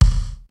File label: kicks snare, kid; Kid Nepro; Elite; KICKS SNARES